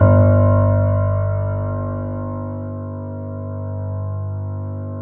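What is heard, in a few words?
G1, grand piano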